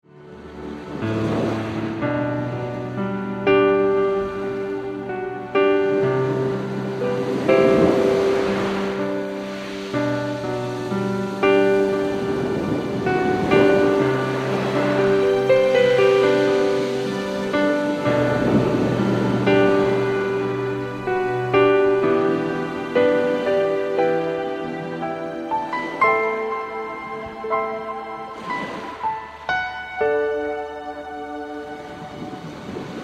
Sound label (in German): Entspannungsmusik